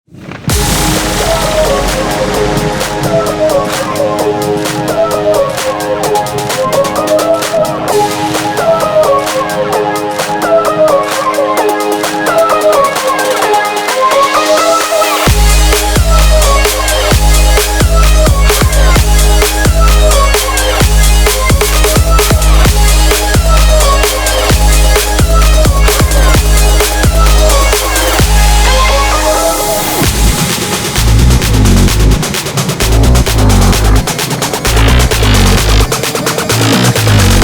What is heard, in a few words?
Breaks